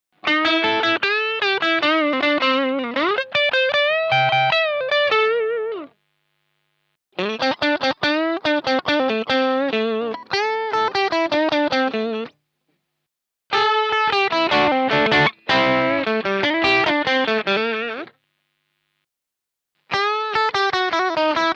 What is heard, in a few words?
Strat Crunch Lead